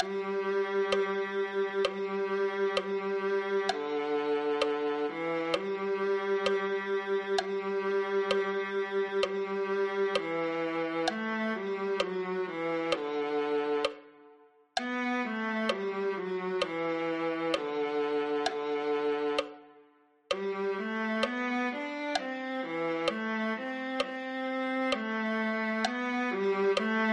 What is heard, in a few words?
viola